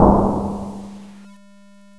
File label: explosion, artillery; nalquas